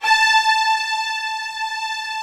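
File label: string, orchestral strings; Greytsounds VOL-1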